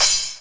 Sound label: Cymbals Crash; Vengeance essential club sounds-3